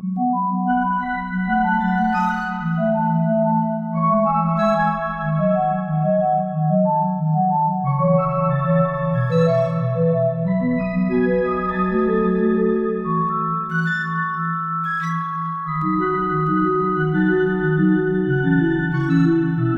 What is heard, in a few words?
Song Loop